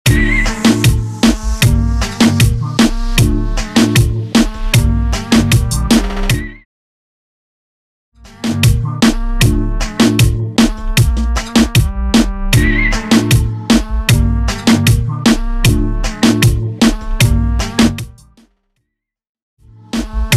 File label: Intro Outro Clean 77 BPM Reeggaeton